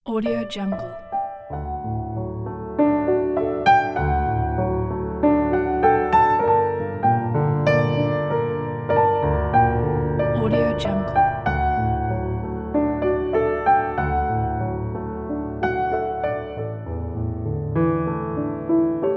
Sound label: mellow nostalgic piano